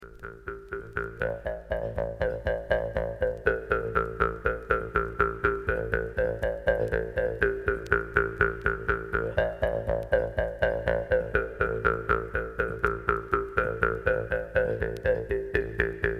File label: Mansi Khanti Jews harp; tumran